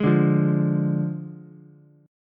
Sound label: D Diminished D, Ukulele; strummed